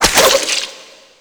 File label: Parasitic Link Impact; Warframe; Infested Lich; Abilities; Zeke